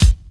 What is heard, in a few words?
hip hop steel kick drum sound e key